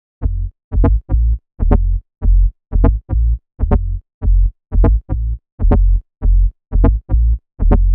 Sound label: Loop Bass 120 F, Deep House; Buddy; Ghosthack; Advent Calendar 2019